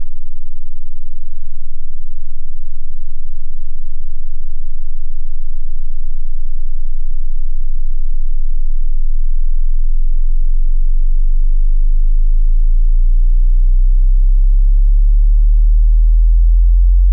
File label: Sweep -5dB; test tones